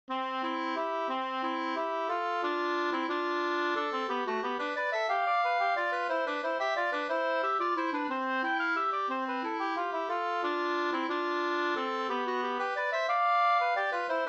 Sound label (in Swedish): vals Cdur